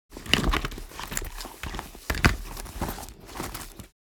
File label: Searching clothes in leather handbag